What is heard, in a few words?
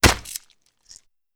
Impact With Flesh And Fluid; impacts